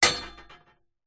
impacts metal hollow frame hit sharp strike rattle